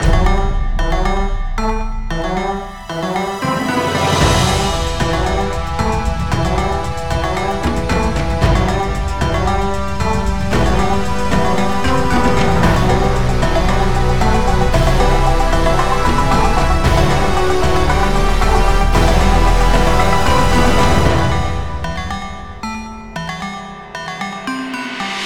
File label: Cinematic Action; Jankbrain